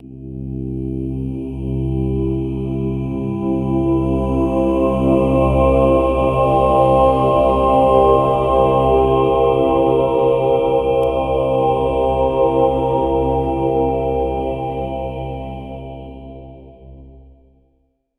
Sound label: soft gregorian chanting